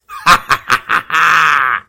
risada

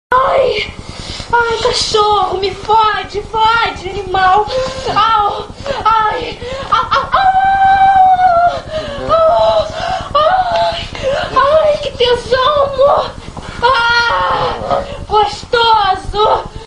cachorro

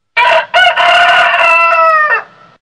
galo cantando

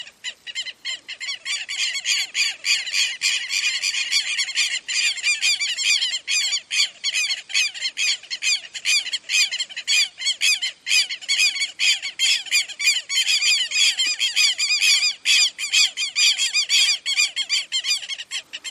a danca do quero quero vanellus chilensis sincronismo das aves

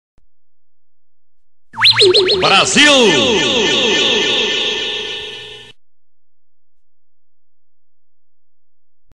vinheta; brasil sil sil

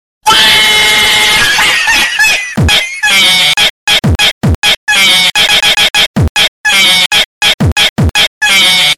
gato rindo funk

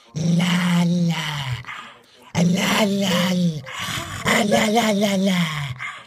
cachorro lalalala lala

